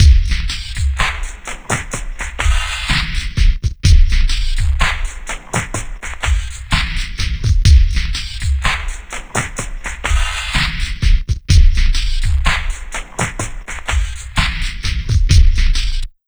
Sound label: PHASER, Trip Hop; USB Soundscan vol.35; Dark Side Of TripHop; AKAI